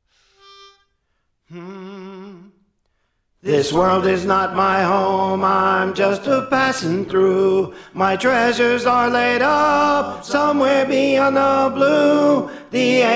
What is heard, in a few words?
Tenor; Praise For The Lord Training